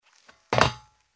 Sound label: Jump on the metal floor with shoes; steps